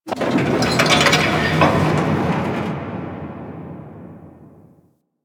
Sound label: Fortress Turret Moves; Ambience; Warframe; Grineer Fortress